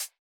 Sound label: hihat; Electro; futurefunk